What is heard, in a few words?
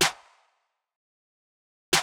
Snares & Claps, One Shots